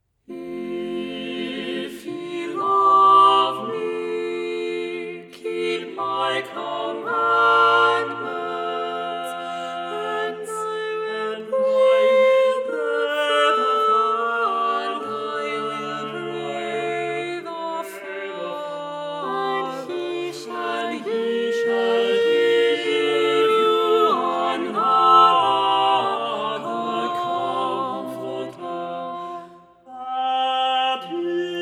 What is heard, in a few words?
minus bass organic; if ye love me